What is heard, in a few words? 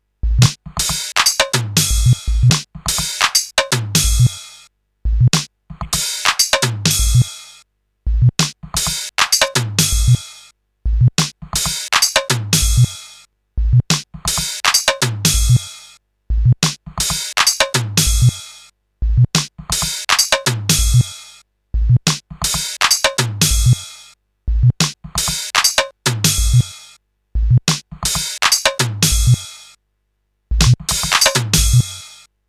stereo beats loop; rauschpartikel